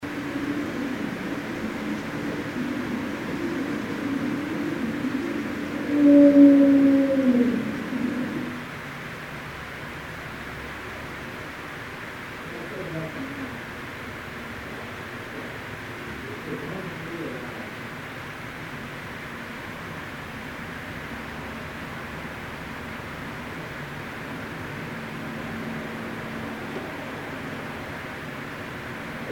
voice, bigfoot

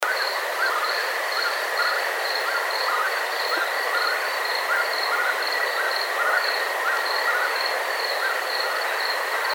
Whippoorwill

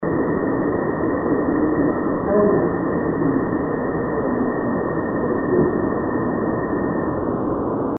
voice, bigfoot

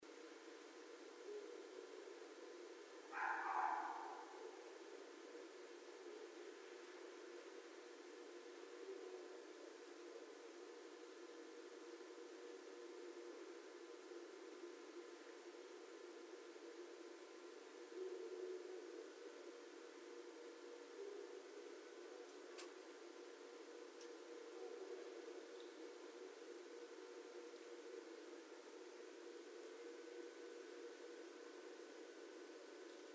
Red Fox

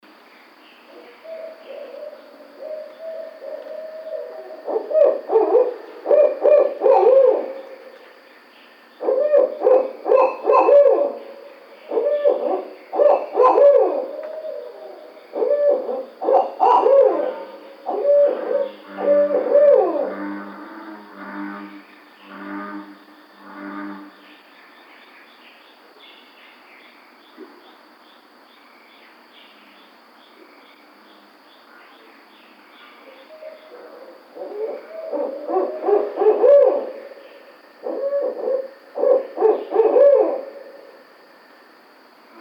Barred Owl

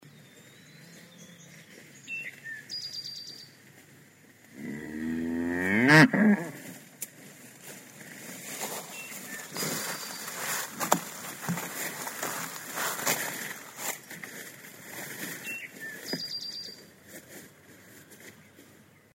Cattle

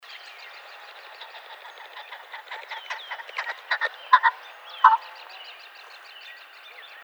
Ring-necked Pheasant

